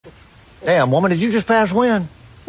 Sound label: passwind